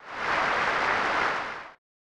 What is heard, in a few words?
Crowd; super tennis; snes